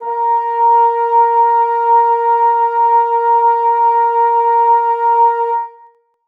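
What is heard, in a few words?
bassoons sustain a#1; Sonatina Symphonic Orchestra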